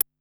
Tick Hit, Korg Wavestation-SR

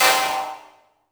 STAB Hipassed Tech Hit; Polyend; Plughugger

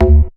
classic bass; Jungle

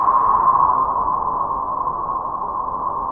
WINTER WIND; Pro-Rec - Synthsonic; JV FILM

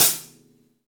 V-Pop Closed Hi Hat, Roland BK-5

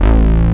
RV BASS

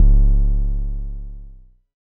DSM2 Sub; MPC DSM-2

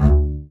SPICCATO, ARCO BASS; Northstar - New Gold VOL-2

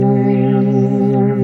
SYN K-2000, Roland

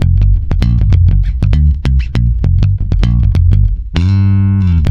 G, swing mute, bass; Spectrasonics; Bass Legends

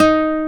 GTR CLS GTR, Nylon String, Classical; Roland L-CD701

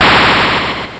Atari Noise Long, Atari 2600

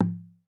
HOPI DRUM, American Natives; E-MU Formula 4000 Series Vol. 4; Earth Tones

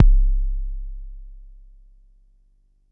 Bassdrum; Jomox Xbase09

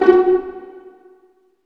Vlns Pizz; Roland L-CD702; Vls Pizz

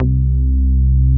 SH 101 BASS

